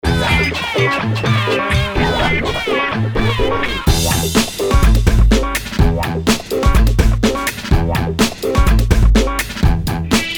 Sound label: Rap Mix